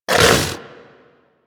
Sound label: Vocal Tranquillized Grunt E; Warframe; Hunting Critters; Vulpaphyla